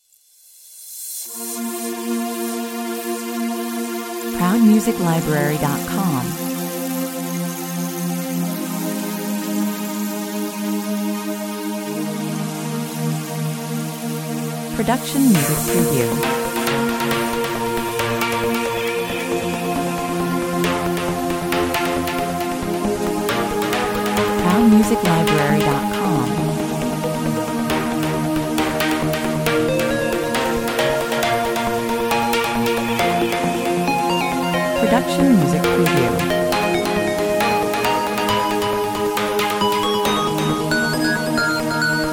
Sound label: techno 136 bpm